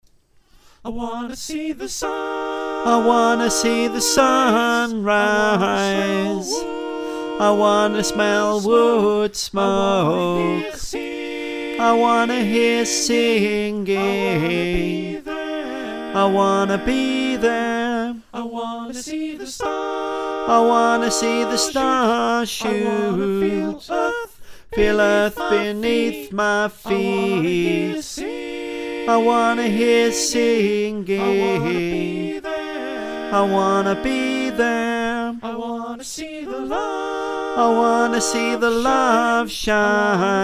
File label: Bass, choir